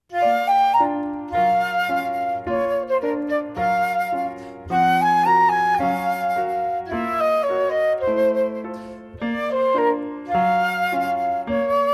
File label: flute